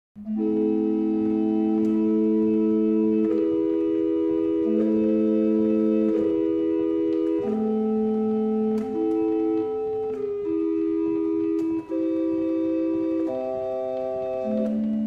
barrel organ; Liddington

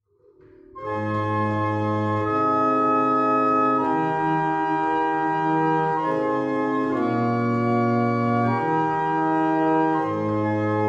German Hymn, barrel organs

